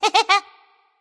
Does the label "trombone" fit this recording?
No